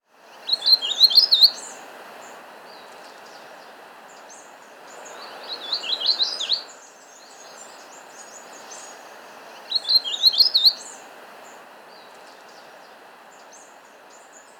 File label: Brown-Capped Vireo (Vireo Leucophrys), Andean Birds; S. America